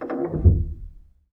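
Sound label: Ped Noise Etc Single Push, Piano; Piano Miscellaneous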